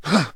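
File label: man exercise; Mount&Blade Warband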